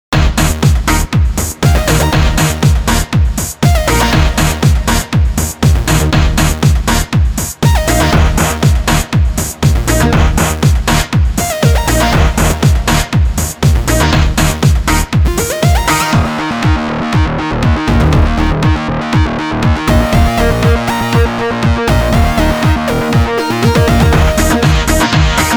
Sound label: Techno